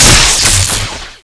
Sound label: warhammer deploy; cstrike; zp knife menu